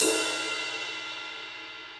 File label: Ride Edge Session Dry; Drums; Drum Hits; Standard Hits; Ableton; Session Drums Studio